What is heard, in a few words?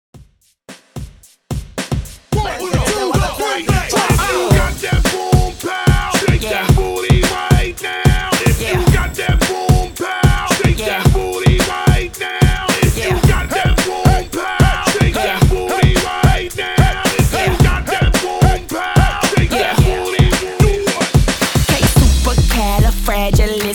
Party Break 110 BPM